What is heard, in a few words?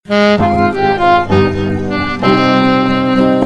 sad music